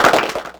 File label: Clapbox Pitch; Ableton Foundation FreePacks